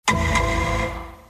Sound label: alert, Message Tones; law and order alert